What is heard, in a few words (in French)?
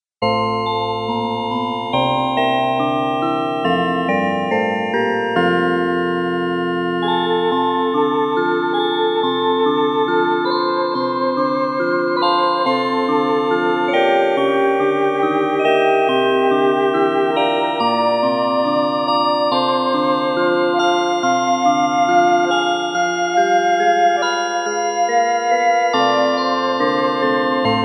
synthetiseur flute